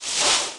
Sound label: Large Spear Scrape